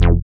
SYN SEQUATOS, Dance Synth; Sound & Vision - Gigapack I CD 2 (Roland)

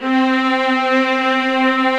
ARCO VIOLAS; OMI - Universe of Sounds

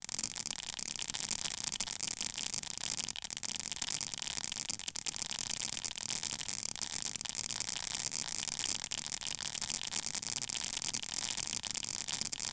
Granular clicky texture; M8; percussion and foley

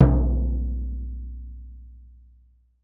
SURDO OPEN; Latin Drums; Latin Drums & Rain

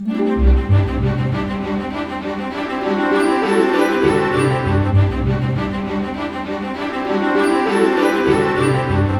BIKE; East West; Scoring Tools; ORC; Bike Ride C

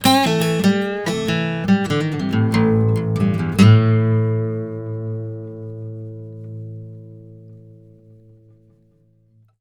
BARI LICK, Blues Guitar; ILIO; Fretworks; 96 BARI LICK

